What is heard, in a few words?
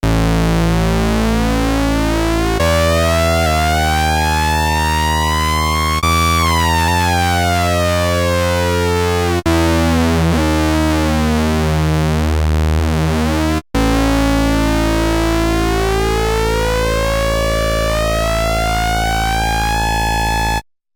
16 saw 4 saw sync; Behringer K-2 MK 2